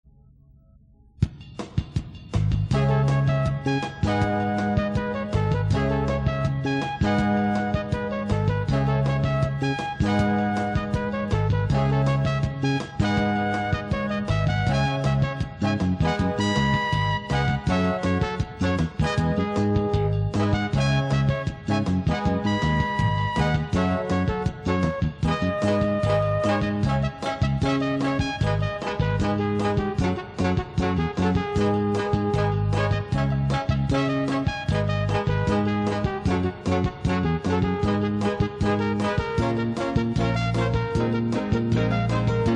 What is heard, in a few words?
Cool Jazz